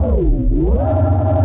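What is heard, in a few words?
Force Field start; naboo; Star Wars Obi-Wan; XBOX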